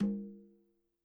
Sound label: CL m, KILLER CONGA